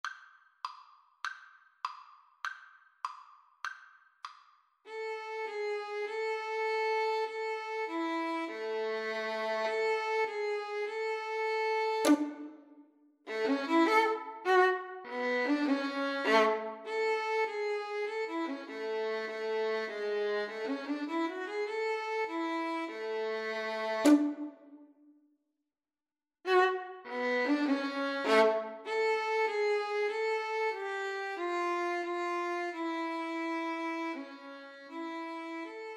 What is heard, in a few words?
pop goes violin